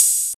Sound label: piercing open hi hat sample C key